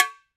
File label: E BONGO S, World Percussion